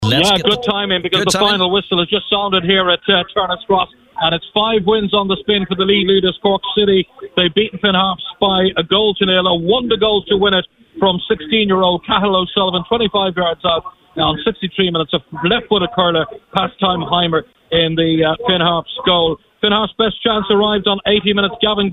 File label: HARPS